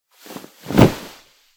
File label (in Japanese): 布団に押し倒される